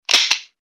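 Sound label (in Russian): shekchok pistoleta, pistol click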